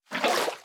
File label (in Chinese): 水桶装水声